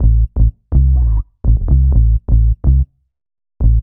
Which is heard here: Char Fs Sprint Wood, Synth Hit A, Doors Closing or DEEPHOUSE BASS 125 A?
DEEPHOUSE BASS 125 A